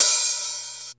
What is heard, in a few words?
RY30 OP HH; Hollywood Edge; The Big Bad Beatmachine